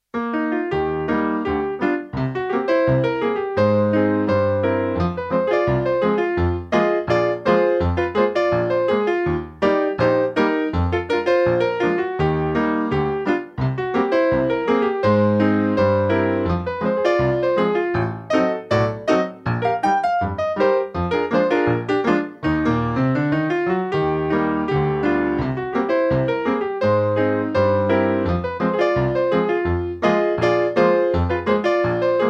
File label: Ragtime slowly